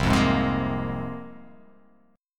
C 7th Suspended 4th Sharp 5th, C7sus4#5 chord; C7sus4#5 strummed

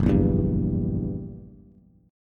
Gb 7th Flat 9th Gb Bass; strummed; JGuitar

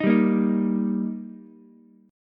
Eb Major, down, Ukulele; strummed

